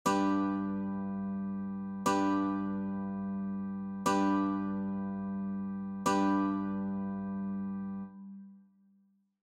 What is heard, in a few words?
G Chord, guitar; childrens